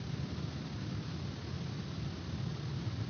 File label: Ambience Loop, outdoor